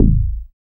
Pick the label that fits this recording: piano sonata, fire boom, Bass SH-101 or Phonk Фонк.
Bass SH-101